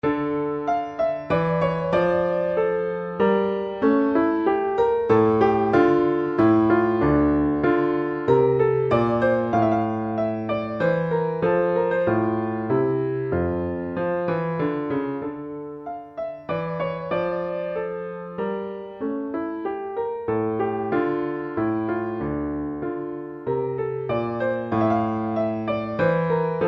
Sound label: Bach Menuet Re Minor